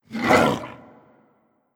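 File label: Skeleton Dog Bite Growl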